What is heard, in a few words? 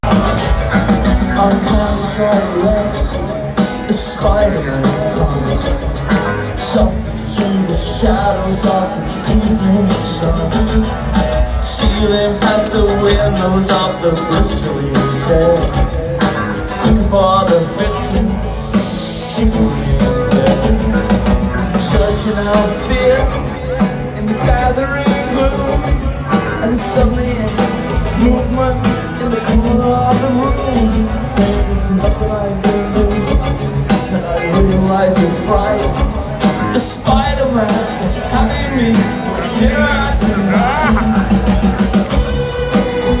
Lullaby